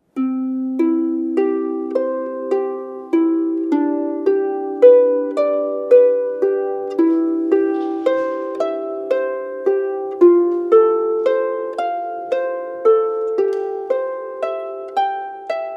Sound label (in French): Harpes